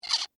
Metal Hinge Squeak, Foley, Metal, Hinge, Squeak; Latch Sound Effects; Latches; Objects

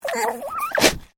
Animal Alpaca Female Grumble; Alpacas on a farm in Gippsland Victoria; Miscellaneous Animal

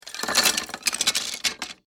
Wood Debris Foley Small Pieces Falling On Cement; Materials

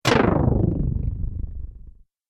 Slowing Time Magic, magic sound effect; Andrew Fleming; Twistedtracks

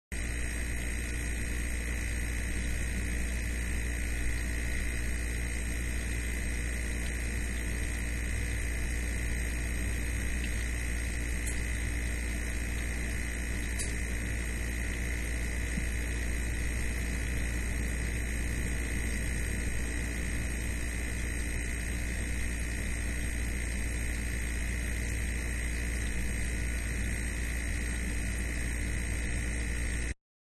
Refrigerator Compressor; Kitchen; Household; Manhattan Production Music

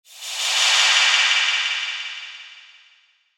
Whoosh Swell Delay; Blastwave

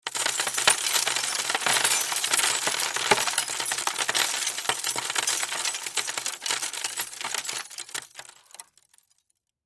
Crash Debris Glass On Wood; Debris (Sound Effects:Materials); Blastwave

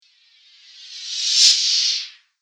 Thin Reverse Whoosh; Whoosh Sound Effects

